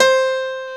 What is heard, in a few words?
PIANO, Pianos Mixe